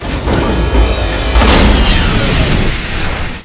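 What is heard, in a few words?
door open; Cosmic Rage; ships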